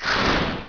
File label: volcano; Despair 1.6